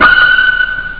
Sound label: kill pling; ST-XX Amiga Soundtracker Sample Packs